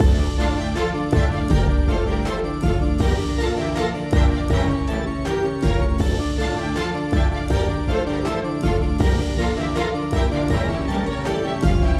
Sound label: Baroque Rush loop, Cinematic Battle Music